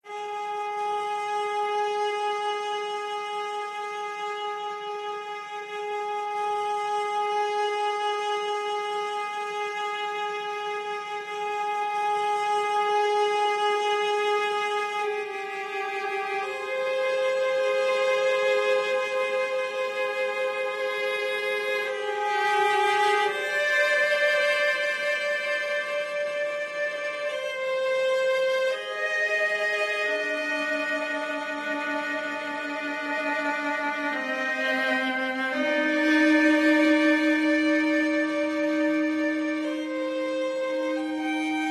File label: Loop Emotional